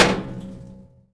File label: metal barrel med; DoomRTX